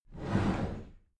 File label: cupboard slide door slide heavy